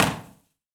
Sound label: Castillo De Los Tres Reyes Del Morro Arch, Impulse Response; EchoThief Impulse Response Library